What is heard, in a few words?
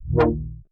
note phantom womp; Polyend; Recue; melodic